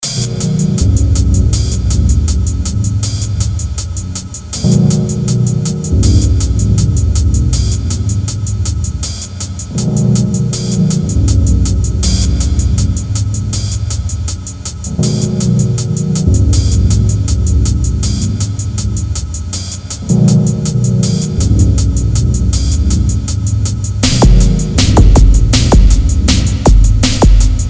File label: Rainy Days, Sounds Of Nature; New Age